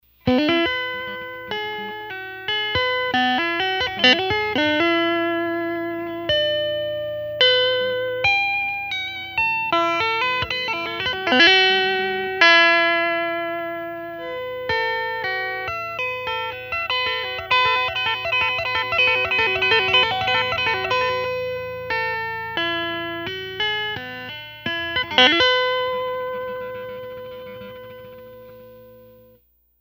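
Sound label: electric guitar tap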